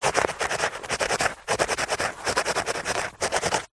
pen ballpoint writing on tissue paper; office

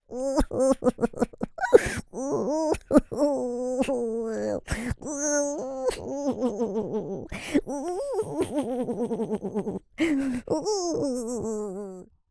cartoon character male voice crying; zapsplat

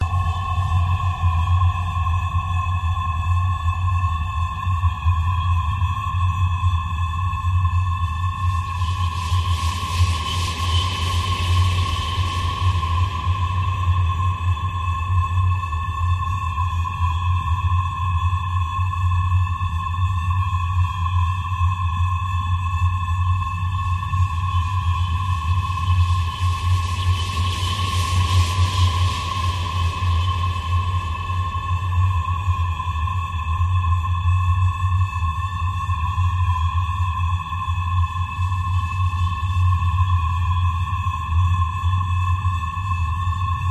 space drone; Audio Mangler

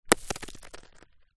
impact rock small hit solid ground

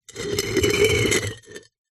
household pot ceramic base lid scrape

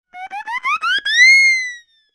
cartoon siren whistle stutter

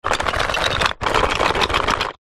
household makeup in pouch shake

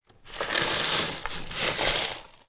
cereal malted wheats in box movement; food

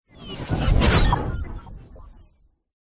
science fiction robot burst

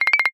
cartoon flutter blink high pitched

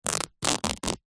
clothes rip cotton short; zapsplat; foley

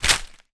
impact small branch leaves fall hit ground; zapsplat

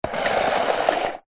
household plate plastic childs slide wood table

